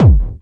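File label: hard bass drums; Bazzdrumz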